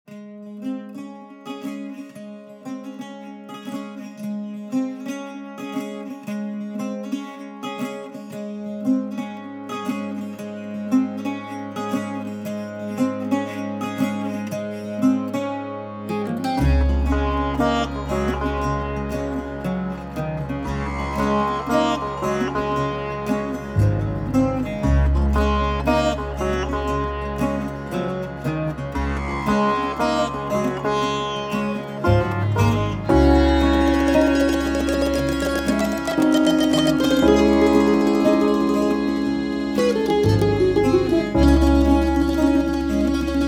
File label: Bells Spring